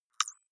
click synthetic light, signale

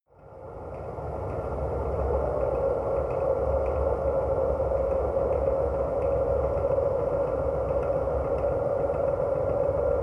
atmosphere underground stream, Horror

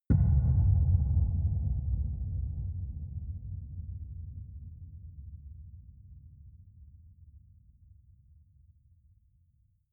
sub hollow impact, Hits/Impacts

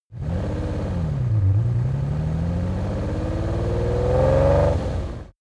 car lamborghini countach start drive

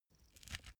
small pepper grinder pressured glass ring; industrie-wirtschaft; gastronomie

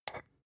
spice jar scratch tonal close single; industrie wirtschaft; gastronomie

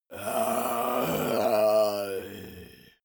monster growl unmotivated; kreaturen

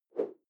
whoosh simple short fast

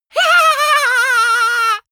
goblin laughing, comedy cartoon vocals; soundpool

